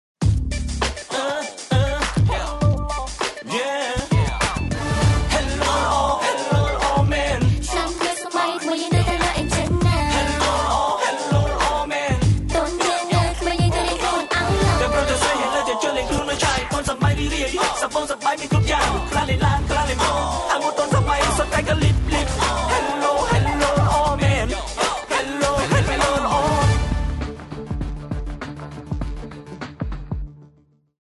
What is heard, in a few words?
MIDI; ZTE; R222 Hello HL2802